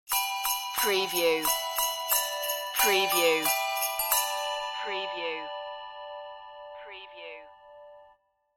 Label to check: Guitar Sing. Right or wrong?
Wrong